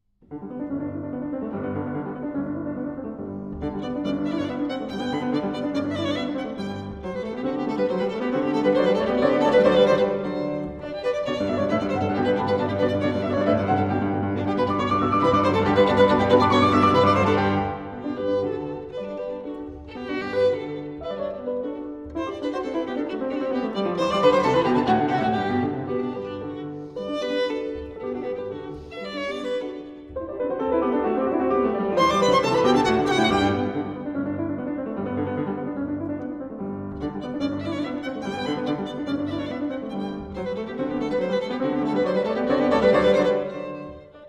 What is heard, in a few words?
Violin Sonata in G Major, Allegro Vivace; Violin Sonata No. 8; Op. 30 No. 3 III.